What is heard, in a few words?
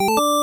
match